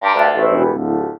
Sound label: Death Voice; Moonface